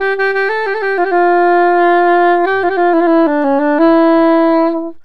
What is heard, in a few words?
SAX, Balkanish Traditions